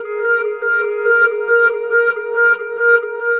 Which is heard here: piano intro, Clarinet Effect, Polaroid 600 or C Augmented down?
Clarinet Effect